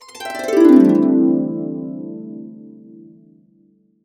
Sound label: C, Harps; USB Soundscan vol.62; AKAI